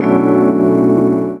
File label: Chords Tones; Equipped Music; Loungin House